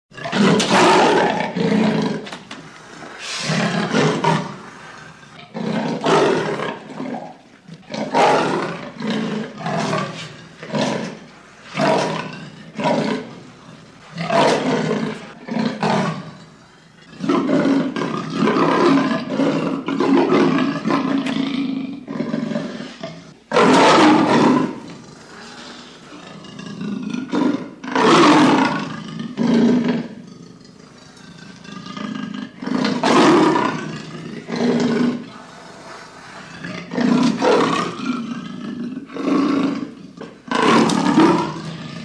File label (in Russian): razjarennyj leopard